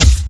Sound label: attack; Dota 2; Dark Seer